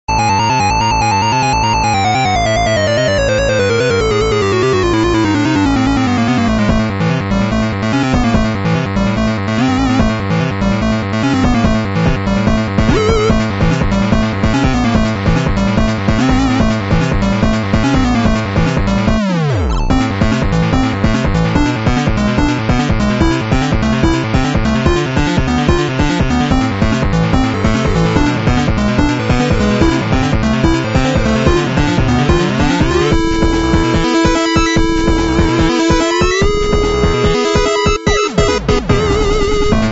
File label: music boss; spacegame